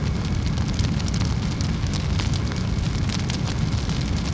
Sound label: torch; Splashdown; XBOX; Soultree; Amazon